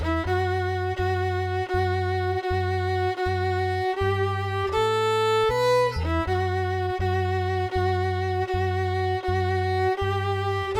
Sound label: violin